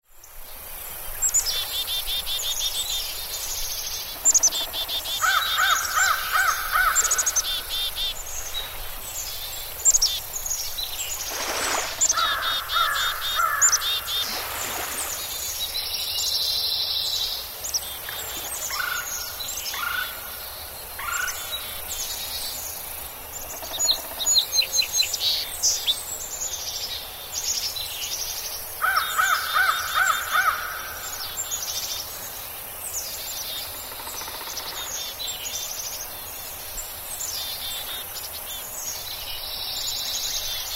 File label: American Songbirds